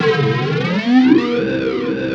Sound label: C3, SynthLine